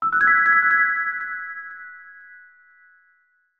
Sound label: ALERT